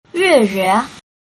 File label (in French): rire